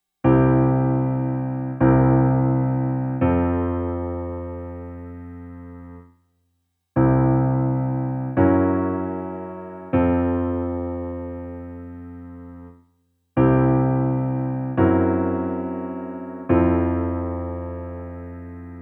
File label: C resolves to F with Tritone